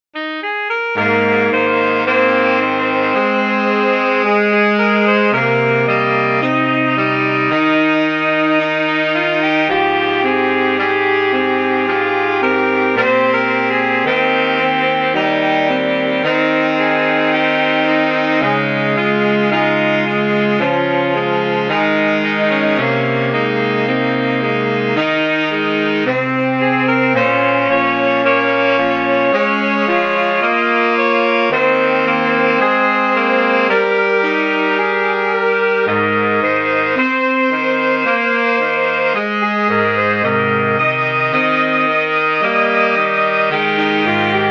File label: sax quartet